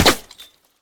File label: stone, hit; tacz-tweak-pack; taczmechanics